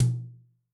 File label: Brsh Jz Lo, Tom, Yamaha DTXpress-II